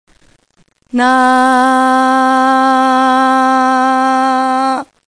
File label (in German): menschl Stimme, Ton a; menschliche Stimme a